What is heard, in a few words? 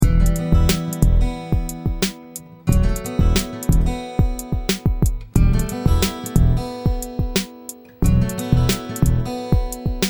Hip-Hop Urban Chic; production music